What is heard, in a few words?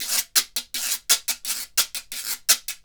guiro, Synclavier Percussion, World & Orchestral; ILIO; Synclavier Percussion 2